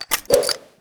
attack; Rust

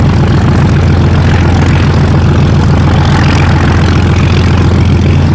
zlin rear, engines; War Thunder

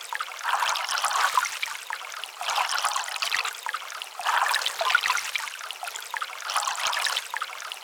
lake; ambient; World of Tanks Blitz

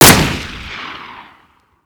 weap aug

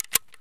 ammo load; Escape from Tarkov; ambush

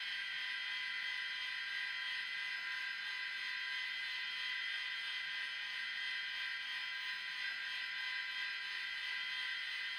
L-39 gyro, aircraft; DCS

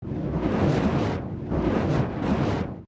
damage hits explosions, Warships

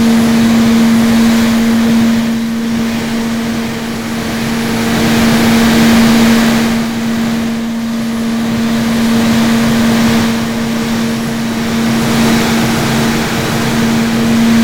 Aircrafts Engines APU AI9V tone; DCS

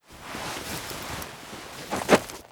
turnbuckle standup mono

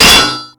hydrant, Explosions; Call of Duty Modern Warfare

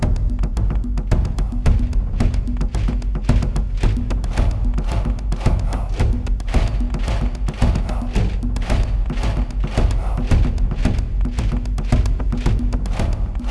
Goody Drums; Ambient; Civ4 beyond sword

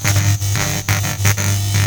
baton spark loop

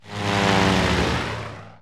unit move airplanes

